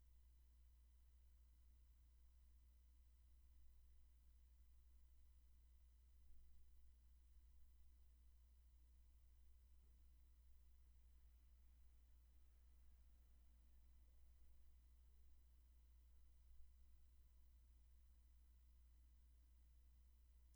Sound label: roomtone Mass Spec